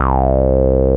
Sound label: A BASS; CMP - Analogon